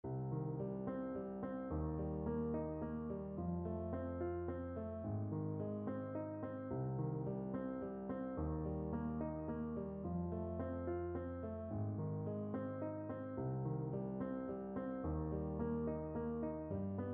baritone, Baritone Horn

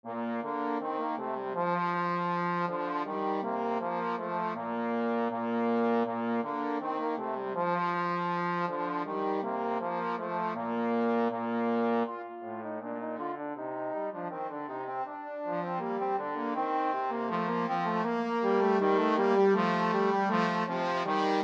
trombone duet

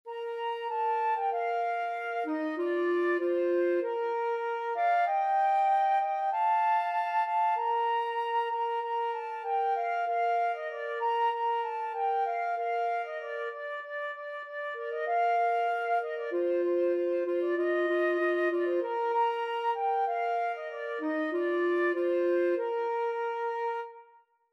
joy to the world, flute clarinet duet

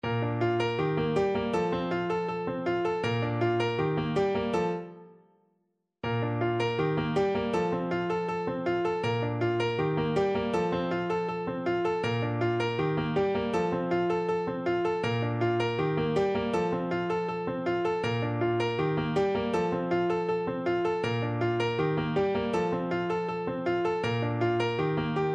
la bamba, Tenor Horn

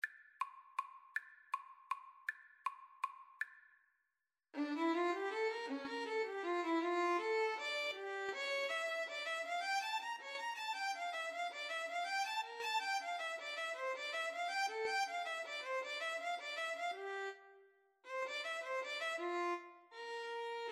Violin Viola Duet; bach invention 4

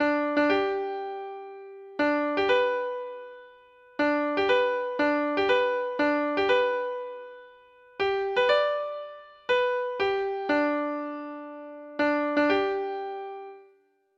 TAPS

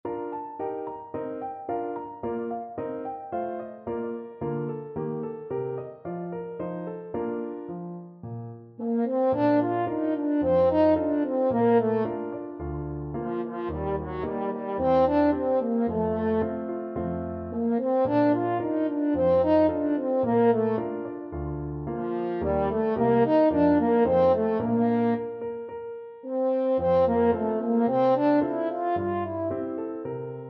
a policemans lot, french horn

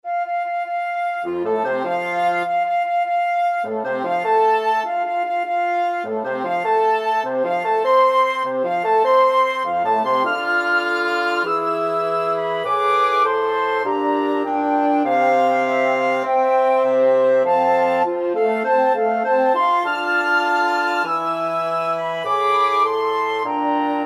flexible mixed ensemble, 4 players

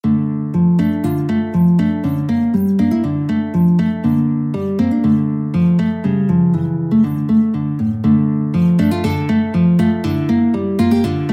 hungarian dance, guitar

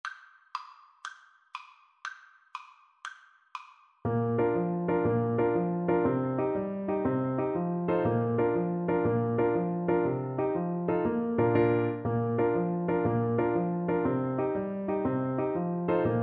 irish jig medley, oboe

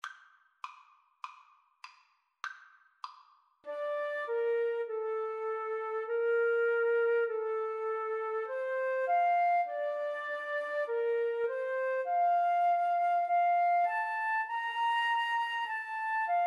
Flute duet

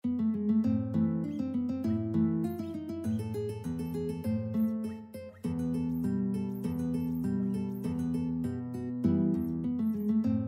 turkish march, guitar

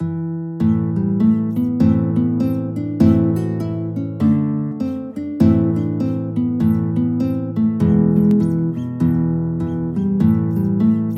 Flatpick guitar; Gilderoy

